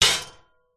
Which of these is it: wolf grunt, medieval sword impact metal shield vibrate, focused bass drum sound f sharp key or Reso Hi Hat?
medieval sword impact metal shield vibrate